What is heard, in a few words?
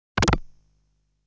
Sound that collapses the ground